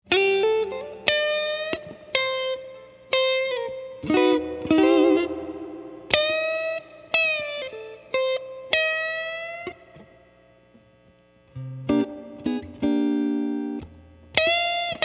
strat bridge blues; burst

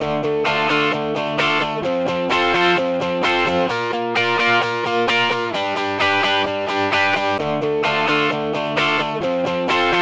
Guitar

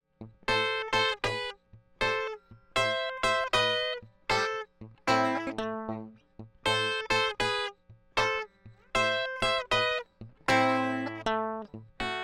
strat delta blues

